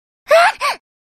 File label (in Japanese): 被弾「（うめき声）」